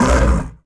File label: panther hurt vox; Civ4 warlords